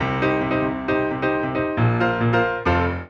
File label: Bigbeat 132